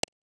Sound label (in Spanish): raton